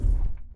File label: footstep jog; Titanfall 2